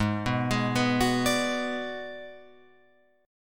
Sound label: G sharp Minor 7th Flat 5th G sharp down Guitar Open F; G#m7b5 arpeggiated